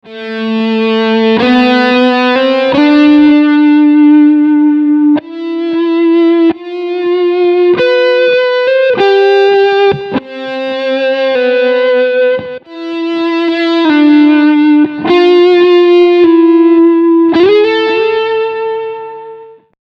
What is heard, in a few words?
Slow Attack Overdrive